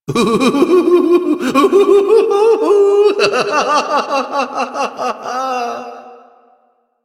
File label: Laughter